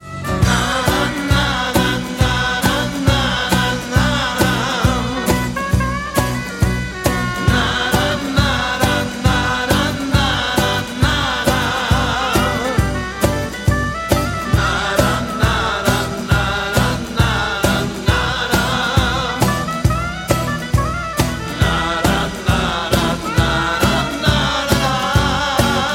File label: acoustic